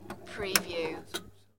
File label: Pinball sound, ball roll and hit object midways